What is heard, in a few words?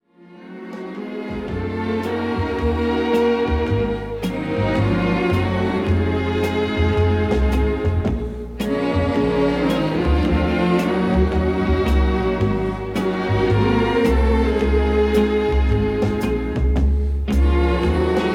Orchestra and Choir